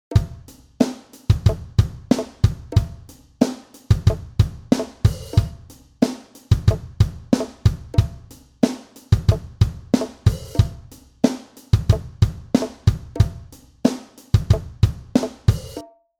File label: Groove